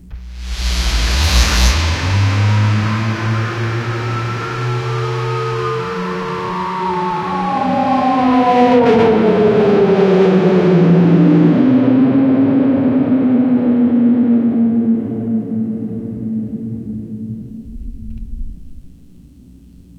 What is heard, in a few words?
futuristic po; rapid